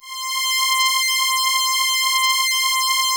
OBERHEIMS

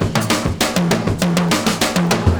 FILLS, 70_s Breakbeats

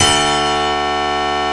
GRAND FF D1, PIANO

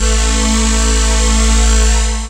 chord 1, sound chord; Ueberschall; Techno Trance Essentials

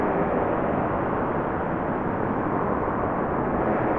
sparse soundscape samples; ReLooped

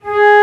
Flute mf G#2; Digital Sound Factory Virtuoso

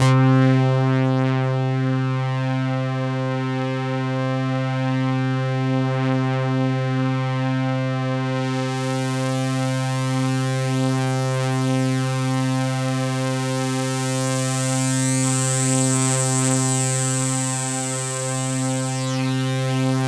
POLY SAW, Roland JV 2080, C3

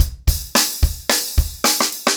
110BPM, acoustic drums; The Roots Drumloops

